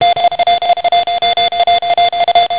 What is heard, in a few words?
cw call